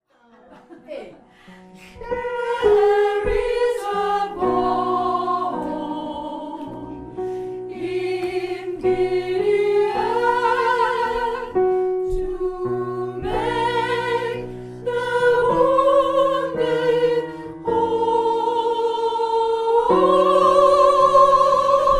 refrain soprano, gospel

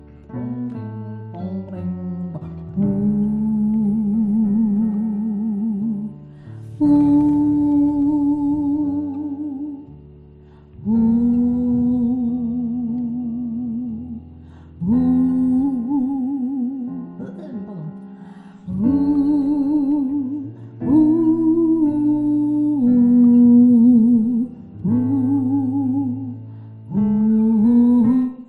ALTO HOMME

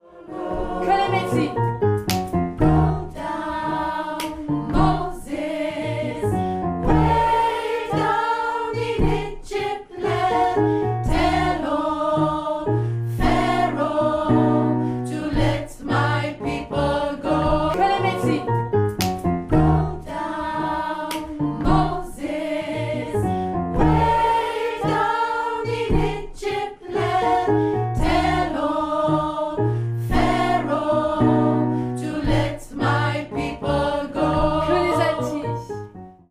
partie mezzo; gospel; GO DOWN MOSES

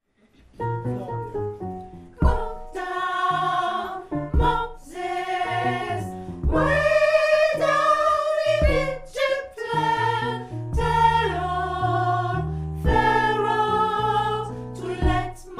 partie soprano, gospel; GO DOWN MOSES

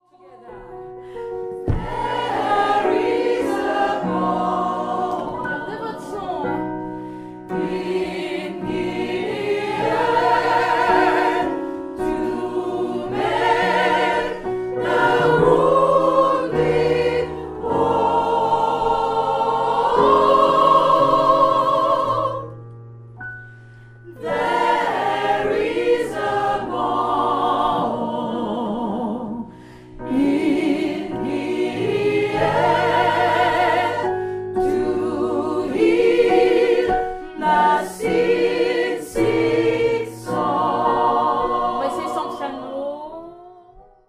refrain tous; gospel

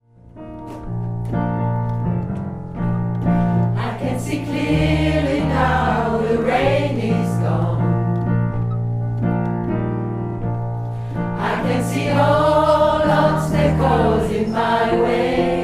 tous couplet unisson; couplet 1; gospel

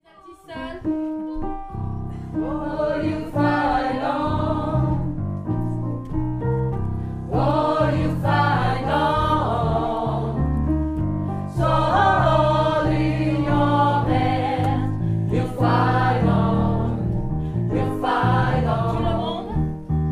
alto homme, gospel; refrain

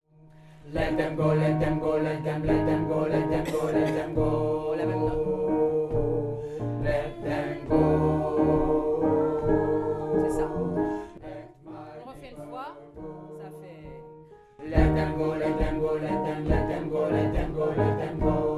partie homme, gospel

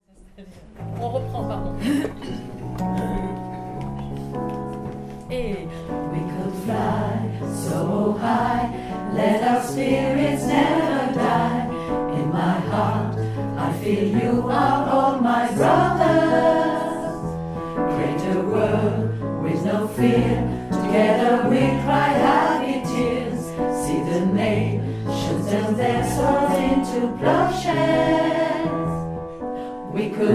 couplet unisson; couplet 3; gospel

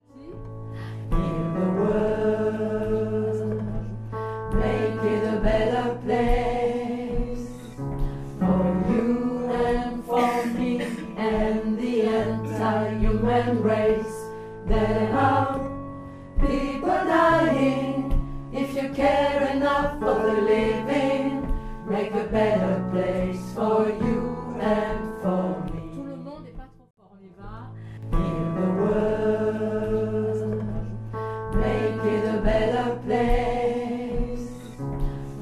refrain alto; gospel

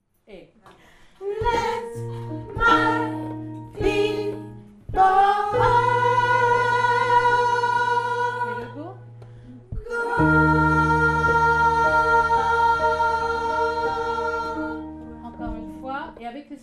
fin mezzo, gospel

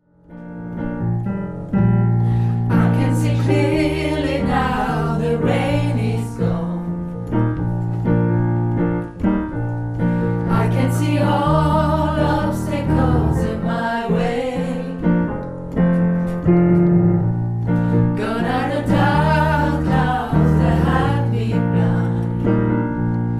couplets soprano; couplet 1; gospel

